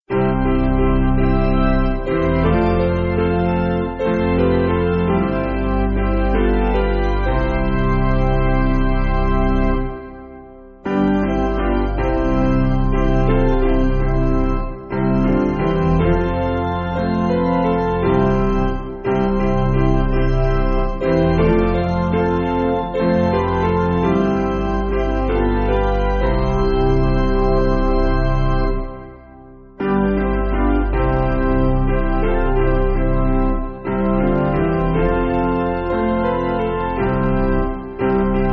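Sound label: Piano, Backing Files for Churches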